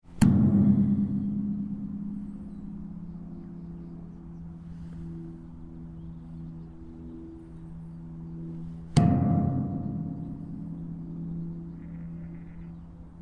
sculpture bonging large standing figure knife edge bronze; Yorkshire; henry moore 1961